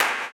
CLAP, blues & soul; ILIO; Vocal Planet

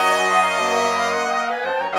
Brass Winds, Orchestral; Sonic Foundry (Sony Creative Software); Orchestral VOL-2; Modern 16

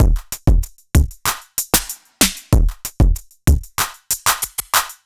Electro Drums 95; musicradar

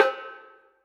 timp; musicradar; cartoon caper

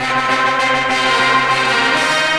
Horn Sect Effect

